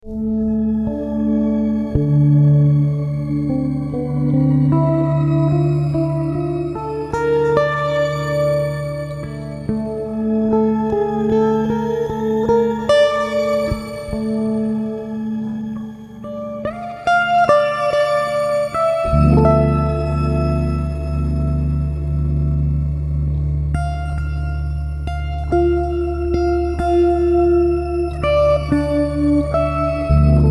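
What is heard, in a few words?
Musical Element, Drum Snare March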